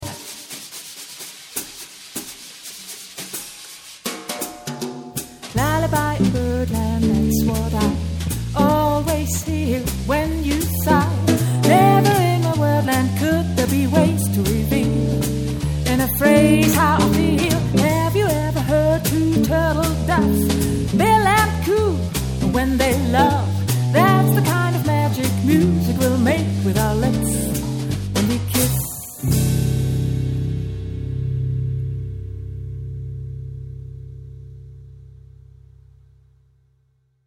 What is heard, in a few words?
Lullaby